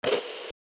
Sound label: button